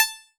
Old Banjo DX100 A5

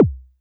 Noise

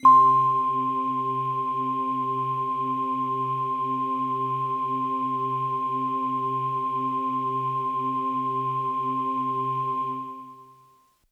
Bell Air Kawaii C3

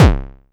BD; TRX

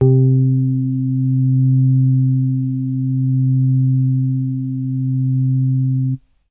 DX100 Club Organ Vinyl Synths C2; vinyl keys

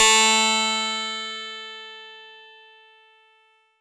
Harpsichord DX100 A3

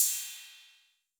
Cymbal A 808 Decay A, Clean, Digital

